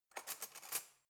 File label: cutlery knife drawer grab